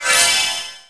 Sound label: Ring Bonus; Finding Nemo; XBOX